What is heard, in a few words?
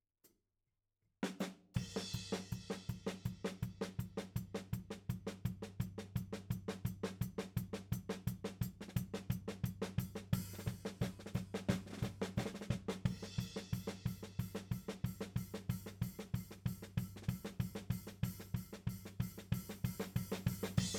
Tom